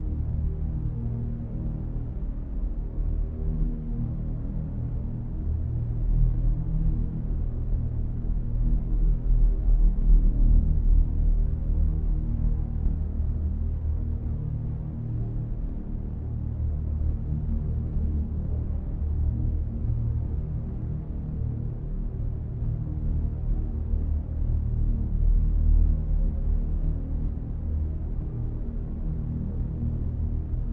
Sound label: ambience very heavy hearted aggressive suspenceful drone type pad; sonniss gdc